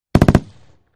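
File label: Hk21 Machine Gun Burst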